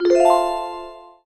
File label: wheel result, european roulette